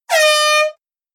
Drucklufthorn Sound air horn Fanfare

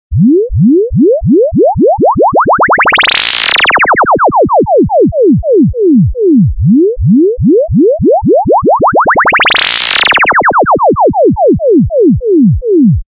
nokia klingelton in hoher frequenz

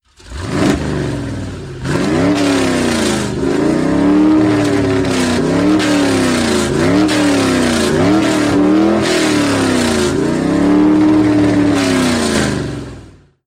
klingelton v8 motor achtzylinder sound

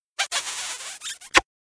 klingelton kuss

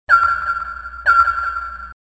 pling

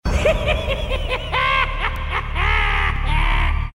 chucky die moerderpuppe lachen; sms ton

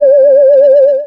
UFO unbekanntes Flugobjekt

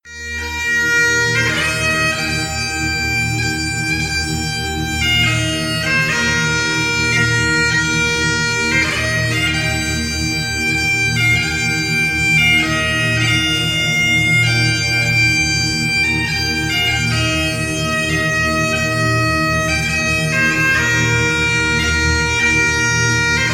klingelton dudelsack sackpfeife

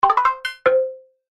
icq tzer erhalten

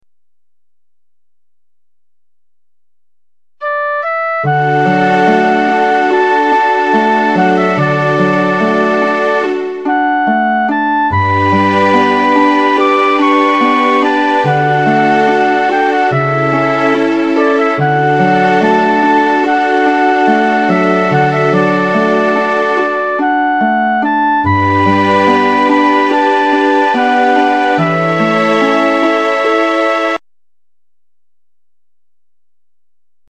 Herr der Ringe sound midi polyphon

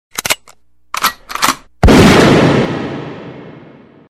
baller sounds fuer handy gewehrschuss

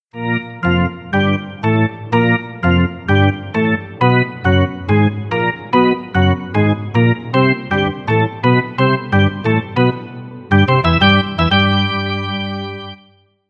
eishockey orgel

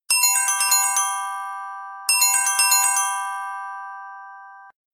Samsung Klingelton chimes Glockenspiel soft chimes ringtone

